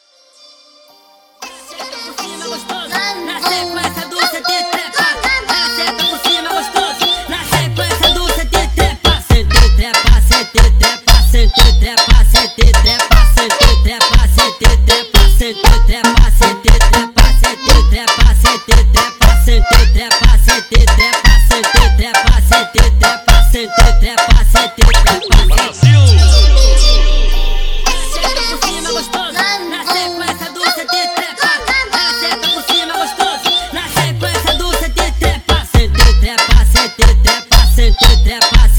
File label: Mambo Funk